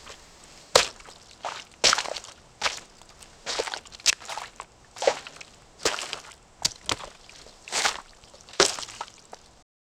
footsteps splashy landing, water effects

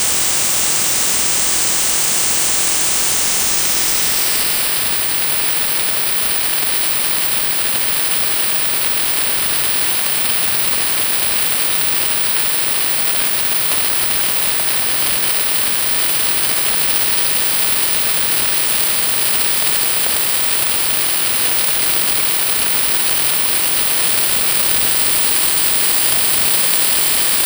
realistic sound of burnin, plasma

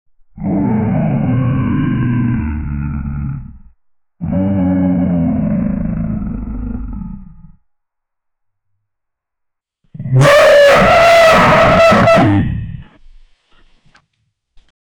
a large dog mutates into, monsters

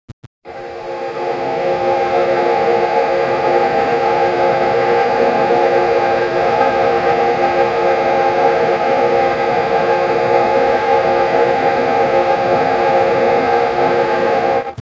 old film cinematic grain

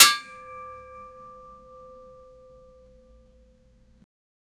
metal lantern pickup; items

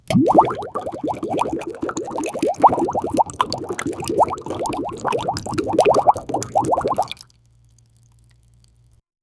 water rains down on the, cascading

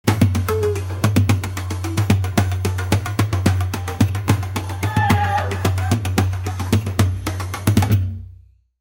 tiki hawaii polynesian, tribal

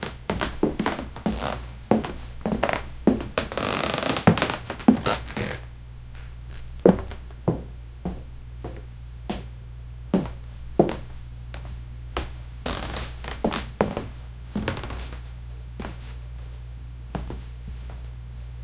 quiet soft steps in socks, footsteps

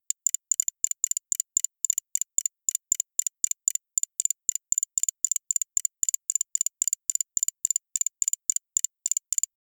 computer

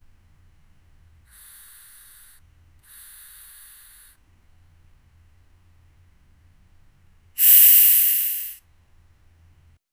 skincare cream sound, cosmetics

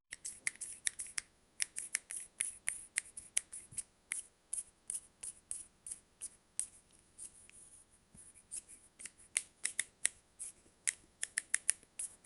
asmr of applying, beauty

